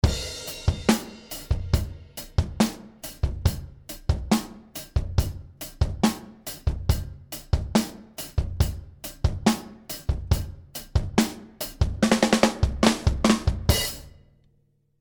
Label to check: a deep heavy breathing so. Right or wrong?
Wrong